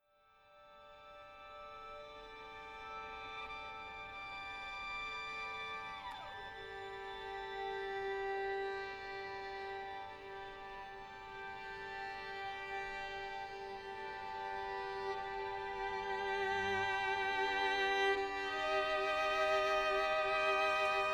Violin; Violin Concerto No. 2; 2. Song Rotation

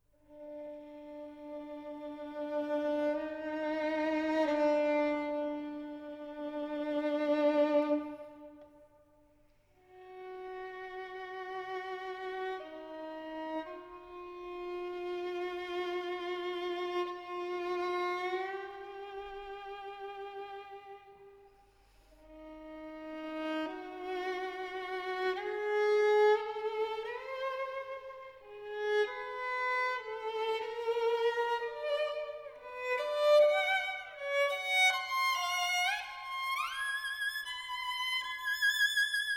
Violin Concerto opening

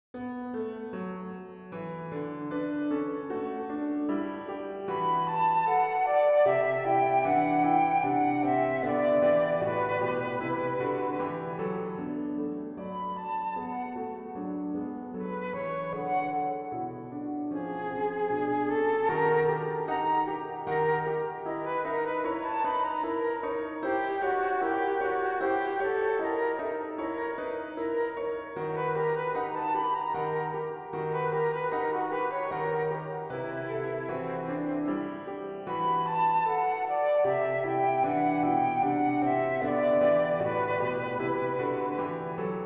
Flute piano

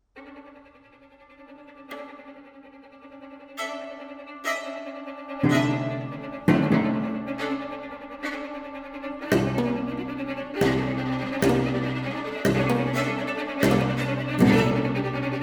Quartet 2nd mov beginning

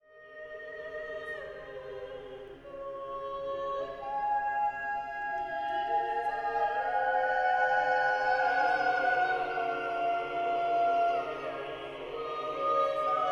Agnus Dei, Choir

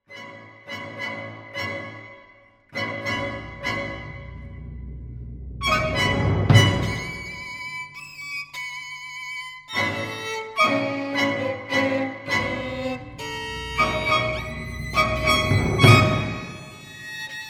Vln concerto

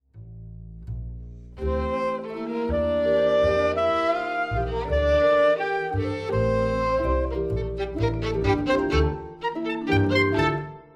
Double Trio

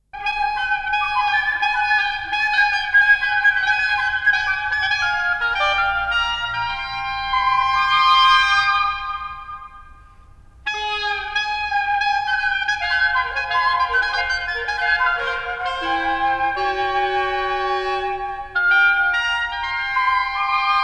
Wind Quintet; Diana Burrell

